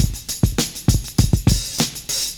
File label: 70s Breakbeats; USB Soundscan vol.46; AKAI